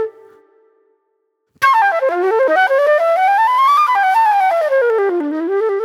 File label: Classic Soul Flute